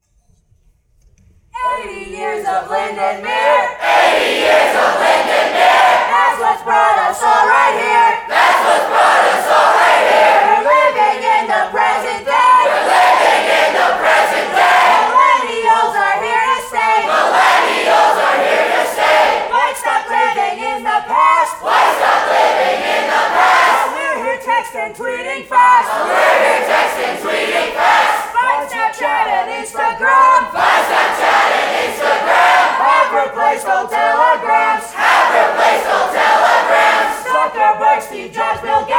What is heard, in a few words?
Modern Day Cheer